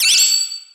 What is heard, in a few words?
Battle - Crown Get; Mario Kart 8